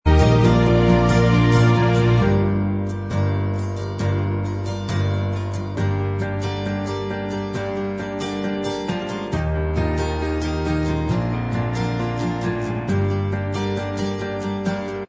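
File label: acoustic, instrumental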